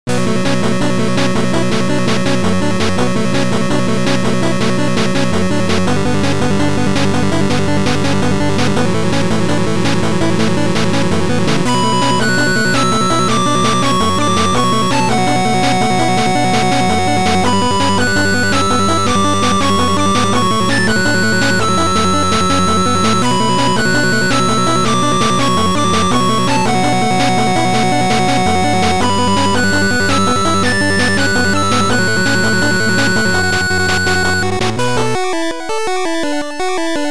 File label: 8bit